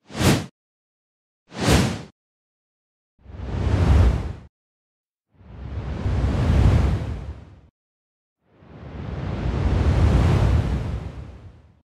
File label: wind turbine